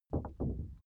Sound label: paper wobble low gentle